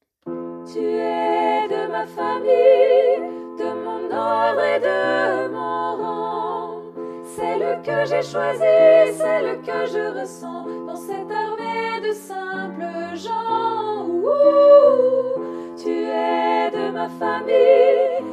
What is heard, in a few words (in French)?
dernier refrain tutti